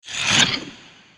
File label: Battle Area Scrape; gnarled forest